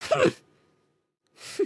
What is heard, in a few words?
indignant, emotes